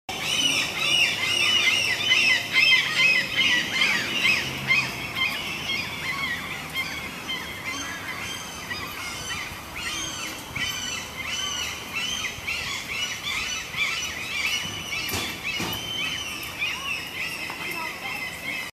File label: seagull cry